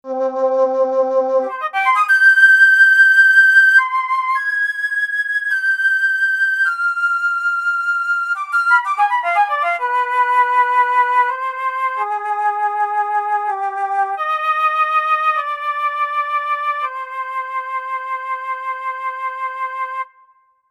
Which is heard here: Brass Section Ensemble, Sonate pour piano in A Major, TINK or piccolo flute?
piccolo flute